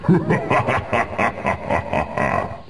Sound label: laugh